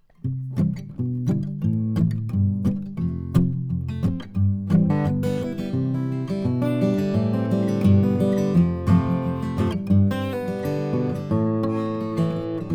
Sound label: Finger Pk; AMI; Schoeps CMT541